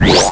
Sword Clone Charge; Charging a sword for cloning

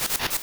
boomerang; Zelda

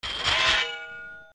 Sliding Spike Linear; zelda; OOT

